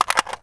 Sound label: mag out, weapons AK-101; stalker